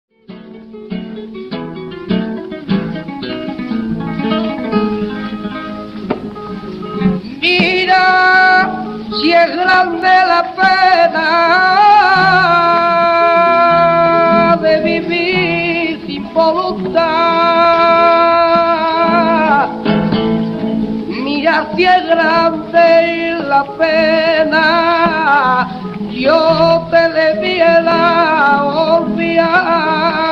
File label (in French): fandango, chant, guitare; "Mira si es grande la pena..."